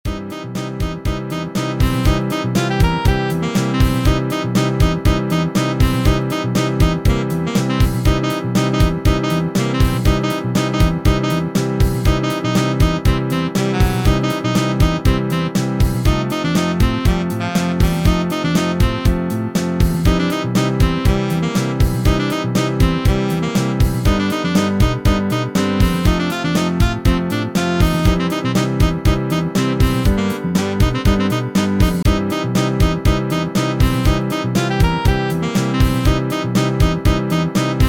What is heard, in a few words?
Sax on Fire